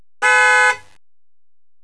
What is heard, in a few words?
fiamm horn